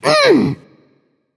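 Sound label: grunt hit, female; smite; voicepack; hera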